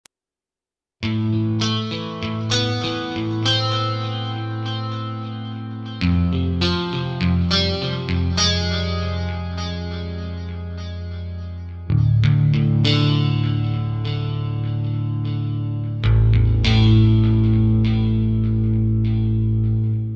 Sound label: OASYS guitar